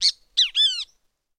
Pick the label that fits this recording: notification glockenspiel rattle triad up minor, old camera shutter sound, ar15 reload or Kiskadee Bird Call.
Kiskadee Bird Call